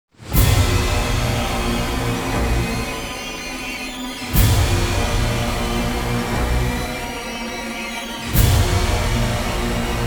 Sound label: search theme; Garry's Mod; huggy wuggy snpc; slender